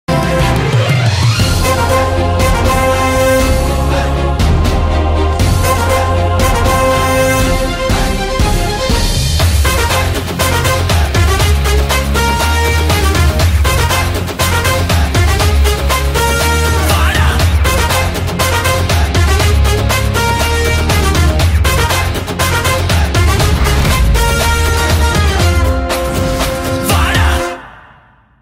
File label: bgm ringtone original background music; Pattas